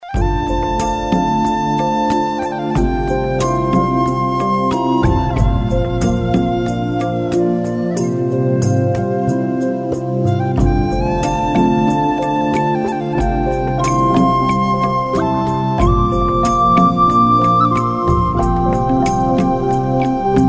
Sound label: Ballroom Rumba